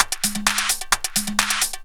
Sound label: percussion, 130, drum loops